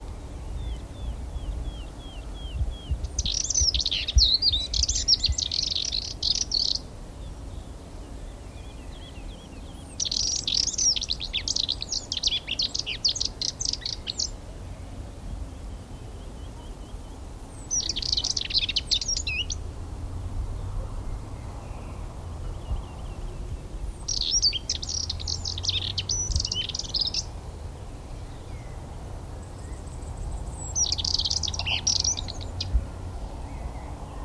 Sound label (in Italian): moltonii, canti sterpazzolina